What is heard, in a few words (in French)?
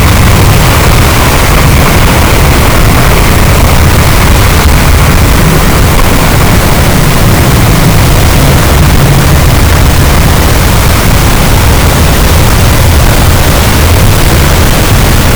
cachalot negatif, gaussian noise, Physeter Macrocephalus; SNR 18dB